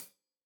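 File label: hat closed, hi-hat cymbals stereo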